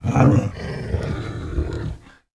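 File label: wolf growl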